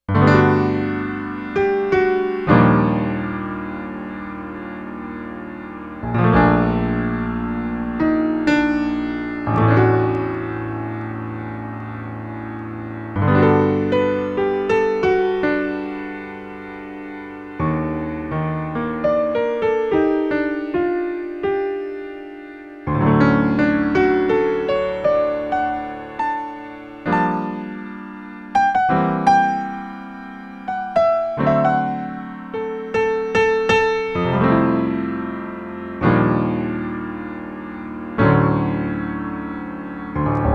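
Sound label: Acoustic Piano Lpf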